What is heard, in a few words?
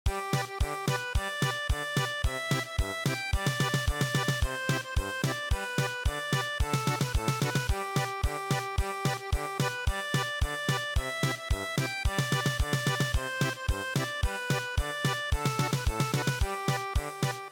German Polka